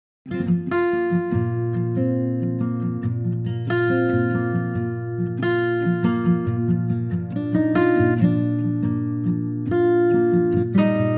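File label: Bb acoustic guitar; key - Bb - vocal range - F to Ab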